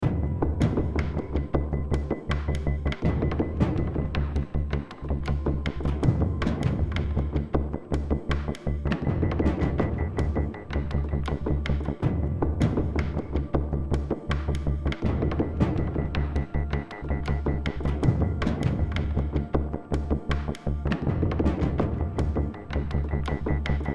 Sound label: percussion jam